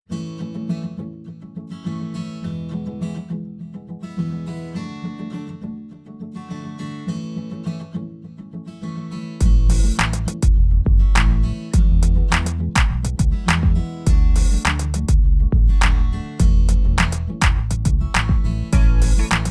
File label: I V IV I Pop A